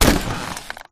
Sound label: cloth break